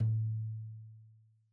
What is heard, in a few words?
Tom2 Stereo, Deeply Sampled Drums